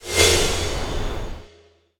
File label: lobby swish; smite; vamana